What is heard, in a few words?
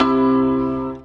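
ZIMBAL MELODY; Best Service; World Colours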